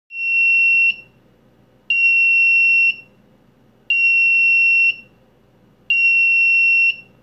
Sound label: Buzzer Alarm; MOD70